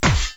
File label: Swordish Strike Hit Impact 8Bit Chip Sound